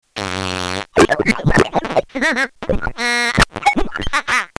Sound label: furby